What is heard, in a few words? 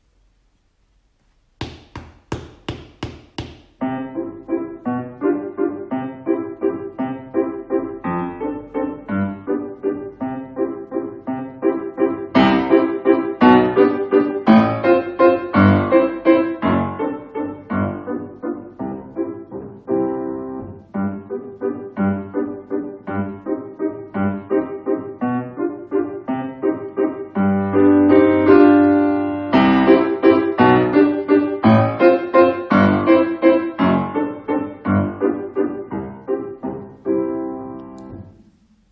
Piano Duet Allegro; Grade 2; Exam Speed; The Teacher part